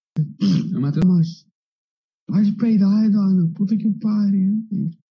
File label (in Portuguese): peido